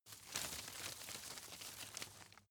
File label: grab rustle soft; berufe; foerster